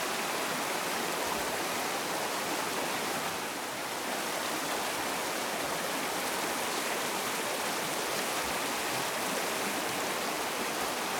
waterfall; Apotheorasis

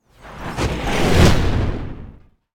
Whoosh Heavy Passing Fire Explosion Sweetener; Hollywood Edge; sound designer's toolkit v2

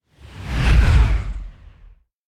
Whoosh Hovering Wind Air Movement - Short; Hollywood Edge; sound designer's toolkit v2

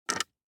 DICE on Hard Wood, Grab, Standard, 5 Five Dice; Samples; ArticulatedSounds; DICE Sfx Party (Extended)

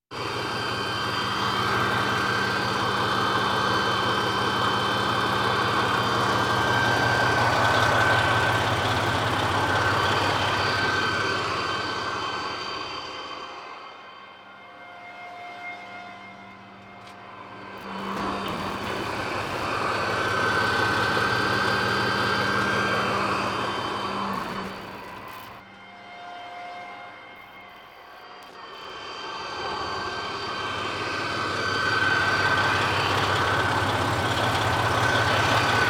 Military vehicles

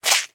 hit human organs; Blind Drive

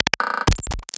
Fat Splash; Plastic Surgery

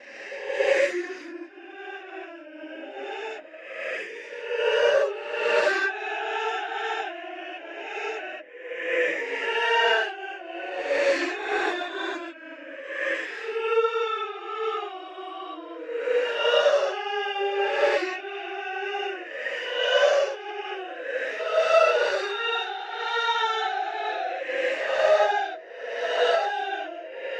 llorona crying ghost loop; DeadFright

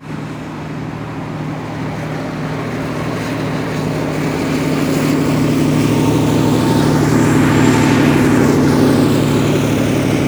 train station bypassing; best service Blue Box

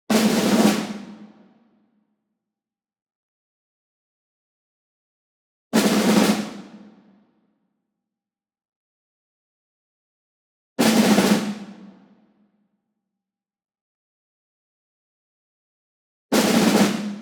ENSEMBLE SNARE Group Roll Medium; boom library; Cinematic Strikes Construction Kit

